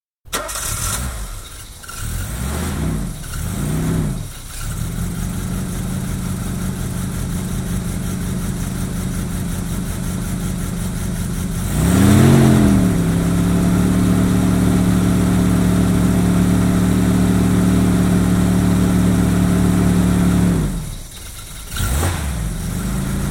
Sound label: Vauxhall Carlton, exterior, switch on, rev cold engine, engine fails; 18 litre saloon, 1986 model; Car Sound Effects; BBC